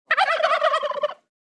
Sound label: turkey gobble